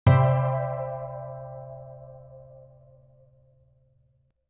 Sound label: ambient